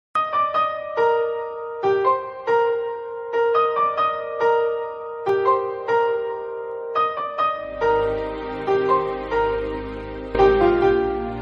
telugu movie bgm ringtone; oye

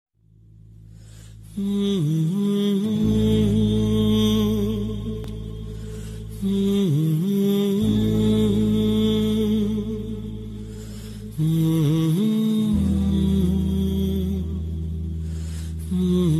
sad bgm; varanam ayiram

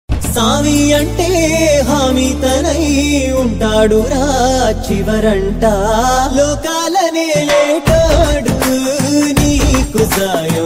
Telugu bgm ringtone; lord shiva

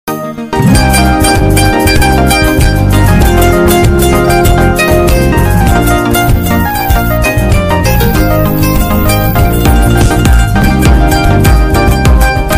love bgm

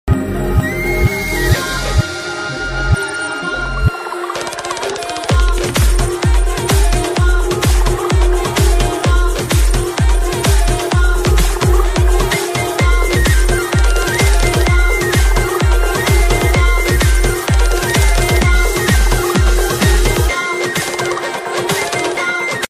interval bgm ringtone heart break bgm ringtone; premalu movie

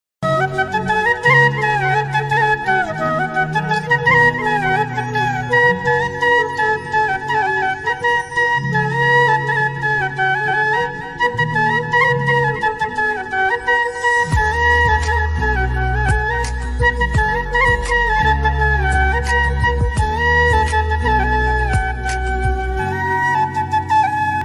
krishna flute beautiful instrumental

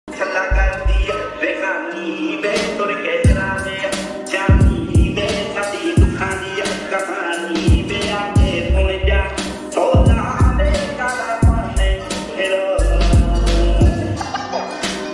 punjabi song ringtone

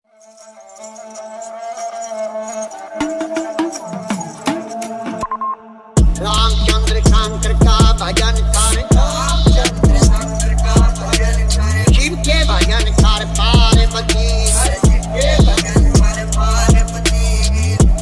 bhajan viral song ringtone; mhadev

